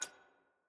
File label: sfx ui collection record open hover; jayce